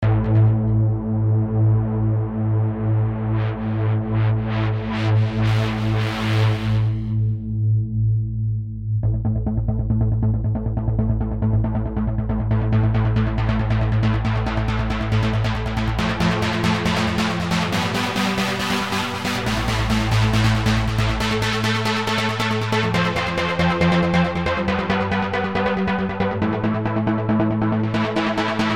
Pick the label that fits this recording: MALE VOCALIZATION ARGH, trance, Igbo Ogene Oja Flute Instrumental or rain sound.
trance